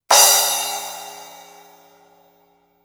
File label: Medium Hit